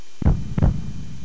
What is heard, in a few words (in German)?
herzschlagen